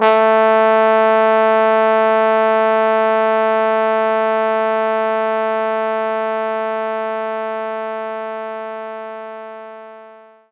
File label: Trombone A2; knights try